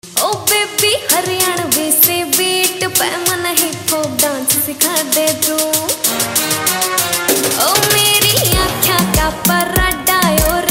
Haryanvi Hip Hop Dance Song Ringtone

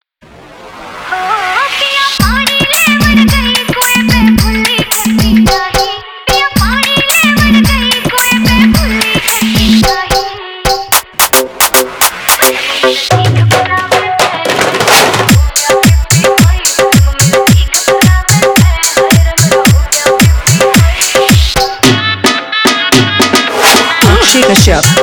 Hard Dholki Remix Song

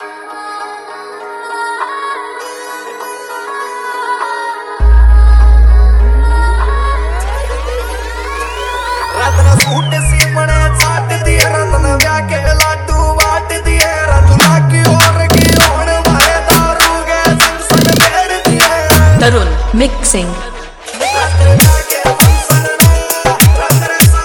Remix Haryanvi Song

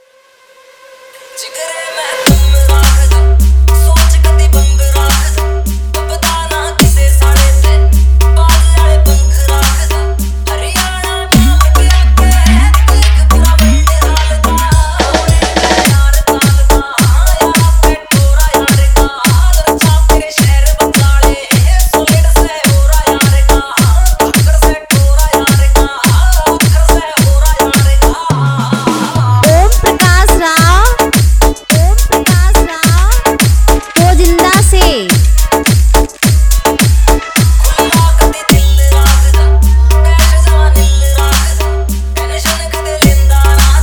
Dj Remix Hard Bass

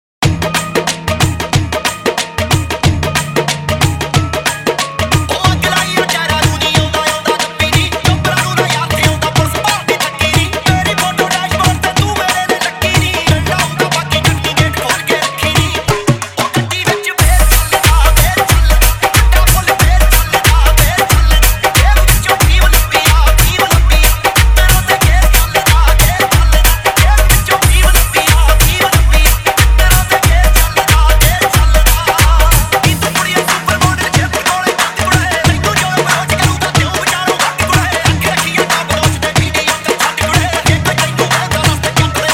Dhol Remix Punjabi Song

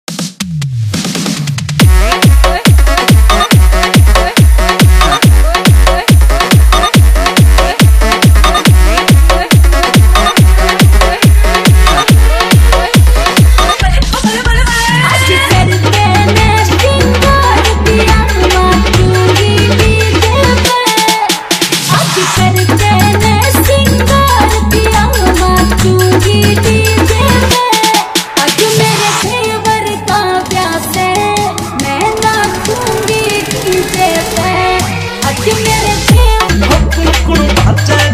Dj Remix Jbl Bass Vibration

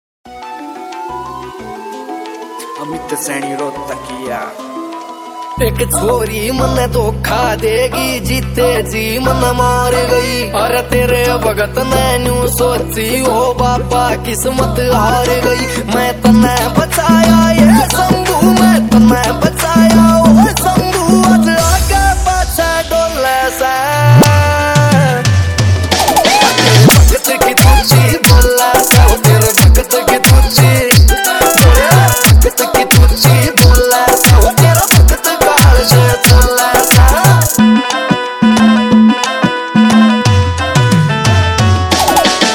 Remix Haryanvi Dj Song

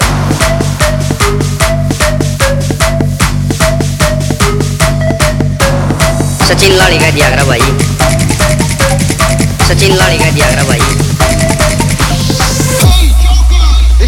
Competition Edm Vibrate Siti Siren Remix

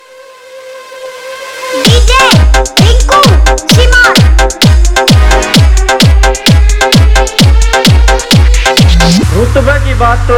Dj Remix Haryanvi Song

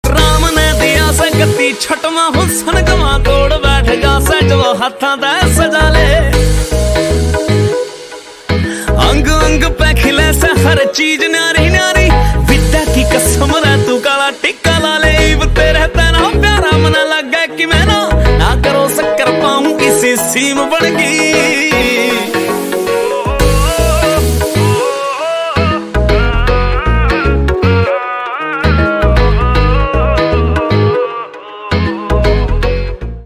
New Haryanvi Song

